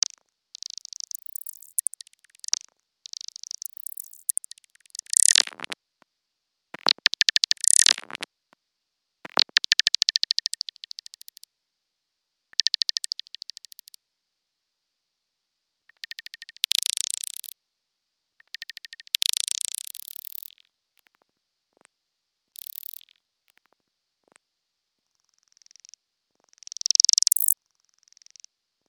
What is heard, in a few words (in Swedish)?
naktermes; Swedish birds; Sädesspov och andra fåglar